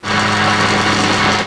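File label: switch; My Science Fair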